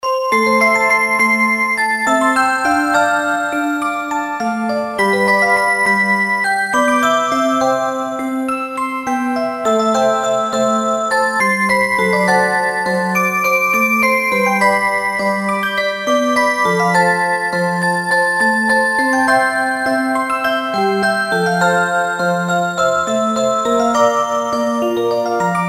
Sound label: rhythm song, clocks